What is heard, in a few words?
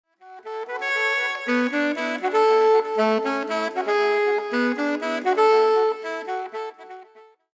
altosax